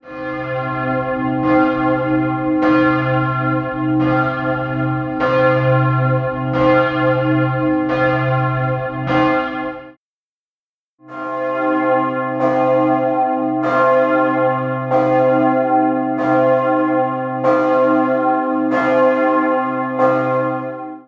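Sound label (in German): münster in freiburg c glocke vorher nachher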